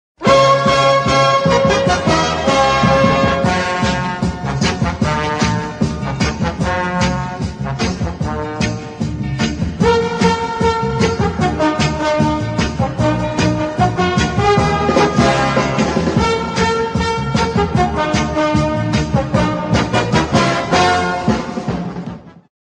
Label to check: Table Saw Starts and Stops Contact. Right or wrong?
Wrong